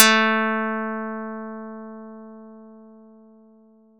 Gitar streng

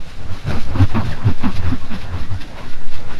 Vingerskratsj

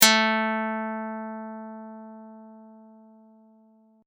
Gitarstreng

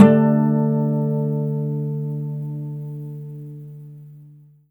Gitarovertoner

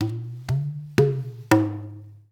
vanntromme

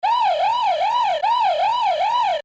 Sirene

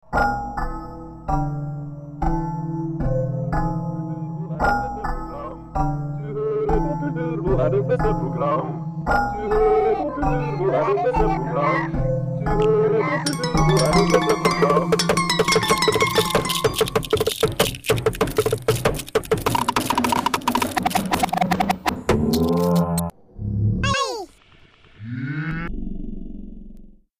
Kulturbo jingle

